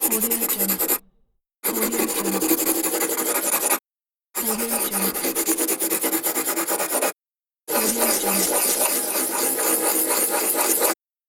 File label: pencil writing